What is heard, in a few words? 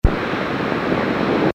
Old record end original sample, Casio SK-1; Sampling sound